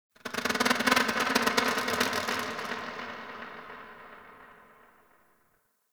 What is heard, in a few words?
Creature Distant Mid; Ambience; Misc Outdoor; Warframe; Infested Eris